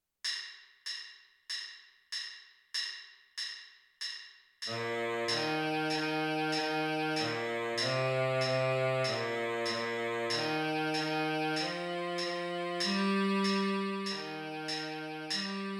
bingo baritone sax

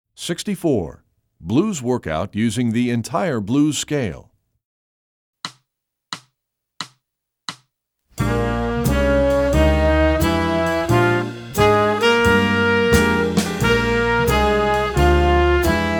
blues workout blues scale

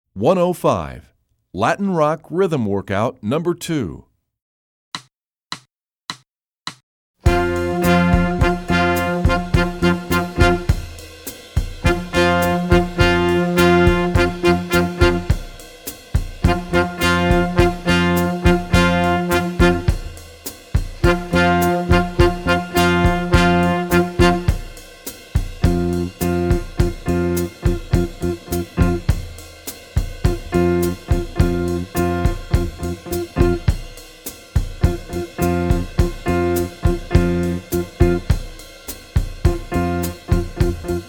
latin rock rhythm workout

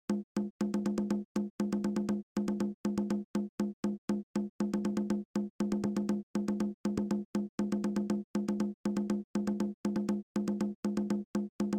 percussion; celtic air and dance

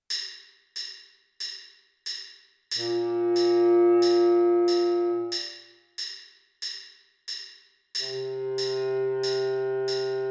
band on parade bass clarinet

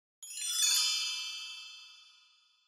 bell tree

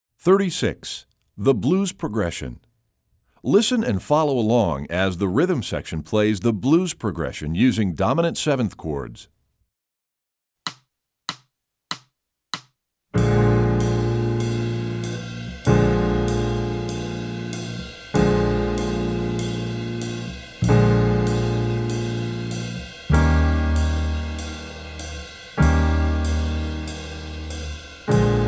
listen to the blues progression

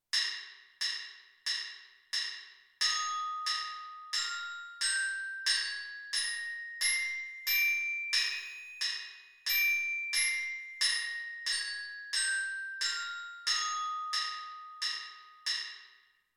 e flat major scale orchestra bells

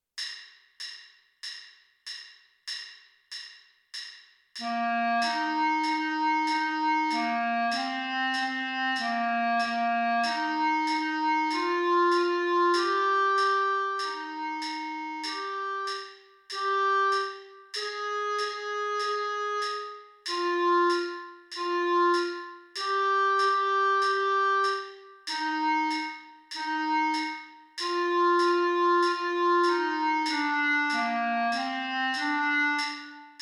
clarinet

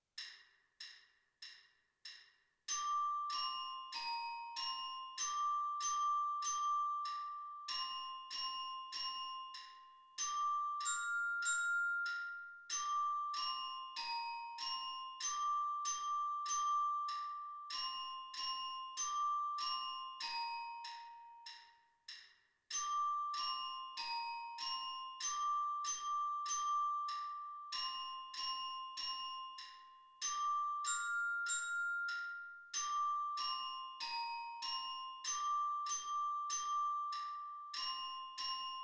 orchestra bells

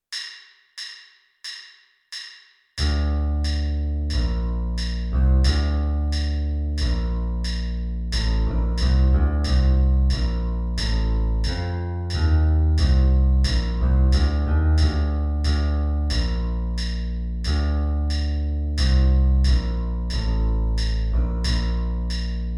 electric bass

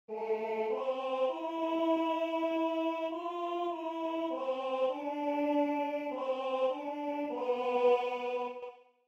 alto

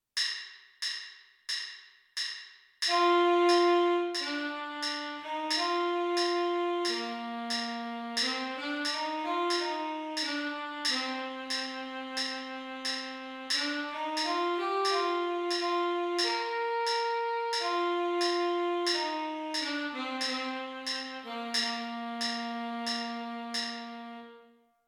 tenor sax part a

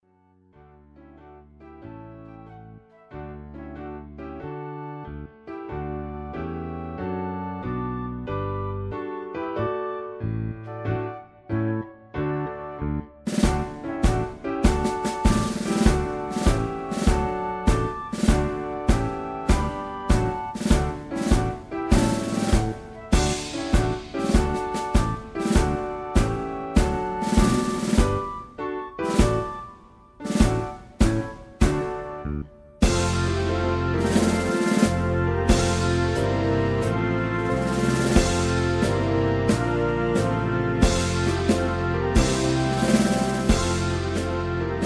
backing track; school of rock

